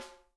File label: snare; Sonic Reality; Interactive Drum Kits